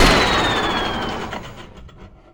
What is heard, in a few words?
Assault Rifle Shot Loop End; Weapons